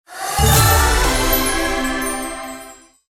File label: big win; Hot Fruits 100